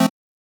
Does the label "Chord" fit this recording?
Yes